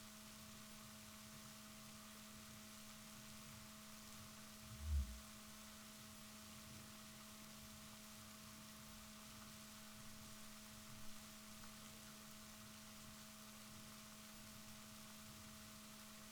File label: rorquals positif, Balaenoptera Physalus Chile